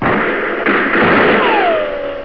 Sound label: gunshots